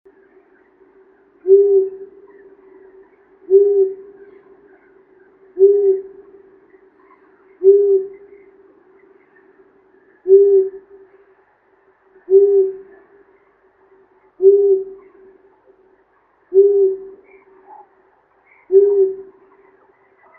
sova ushastaya asio otus patsani, zvuki prirodi

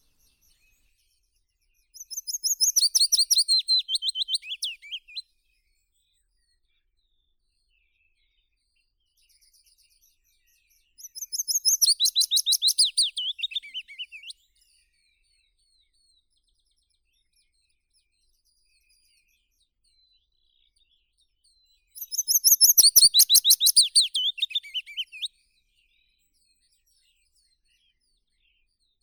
penochka vesnichka phylloscopus trochilus; zvuki prirodi

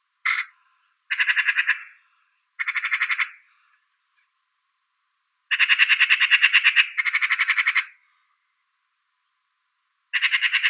zvuki prirodi soroka obiknovennaya pica pica

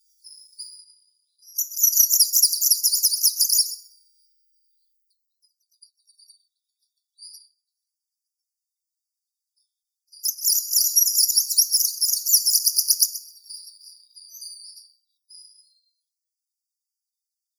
penochka zelenaya phylloscopus trochiloides

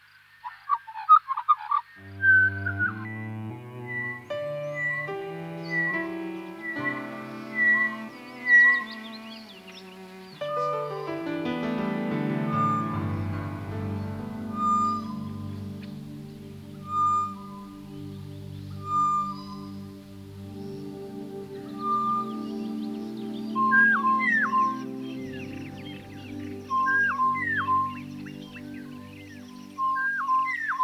sorokoput, zvuki prirodi

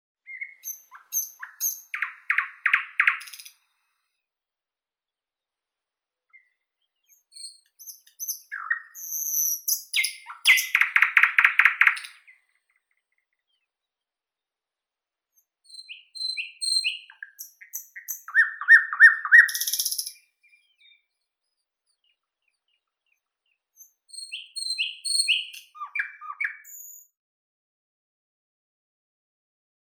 solovei obiknovennii luscinia luscinia, zvuki prirodi

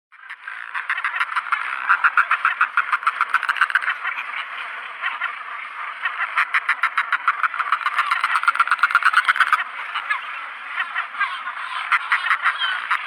glupish fulmarus glacialis

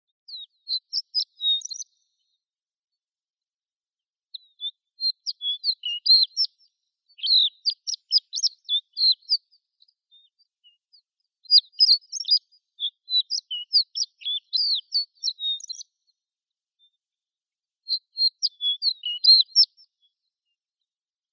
zhavoronok rogatii eremophila alpestris, zvuki prirodi

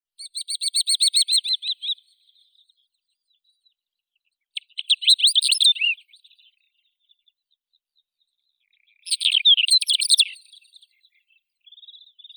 zhavoronok malii calandrella brachydactyla, zvuki prirodi

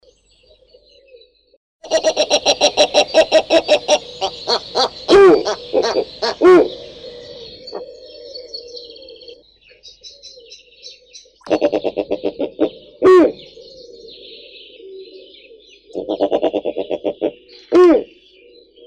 sova belaya nyctea scandiaca, zvuki prirodi

